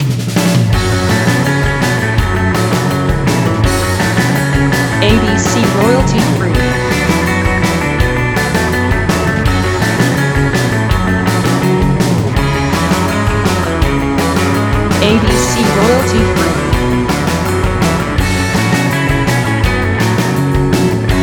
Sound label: Surf Indie